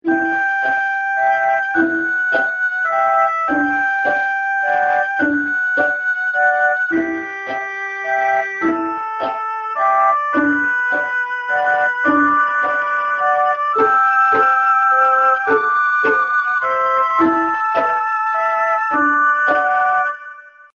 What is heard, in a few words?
romantic tune ringtone